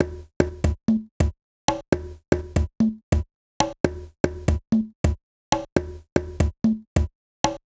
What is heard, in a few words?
Conga Loop 125; Equipped Music; Loungin House; Perc Loops 125